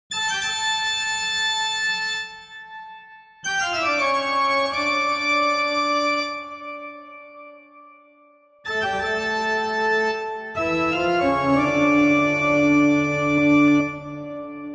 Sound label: toccata